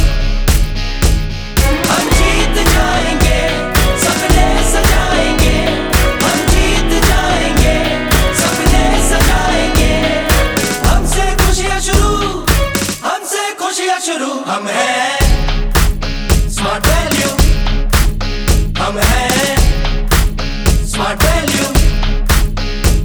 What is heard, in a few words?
Caller Tune